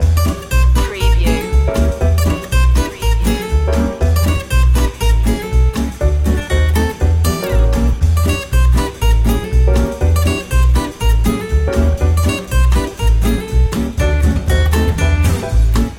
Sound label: here comes that jazz loop